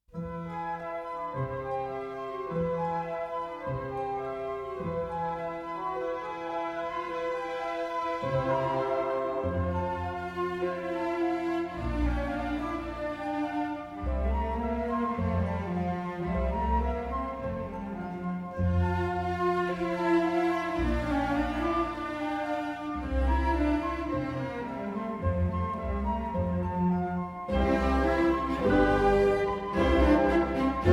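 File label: Orchestra Intermezzo; Rundfunk-Sinfonie Orchester Berlin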